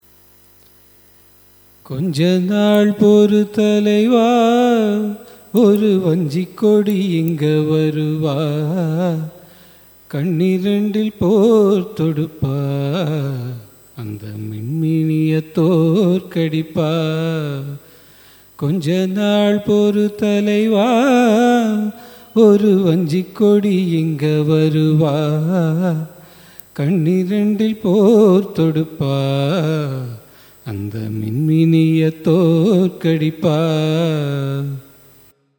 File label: raga ananda bhairavi cine